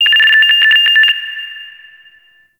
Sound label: Interior Orbital Station Telemetry High; Warframe; Tau